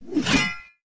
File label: axe jump attack; player; unsighted